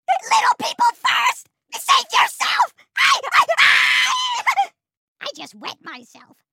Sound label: Grunt; Halo